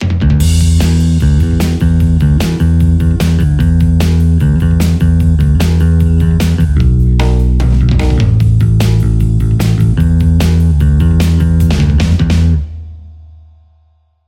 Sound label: bod low; tse; ampsims